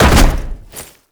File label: Land Heavy Metal; Movement; Warframe; Uriel